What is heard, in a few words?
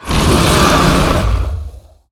Dragonmaw Scorcher, Play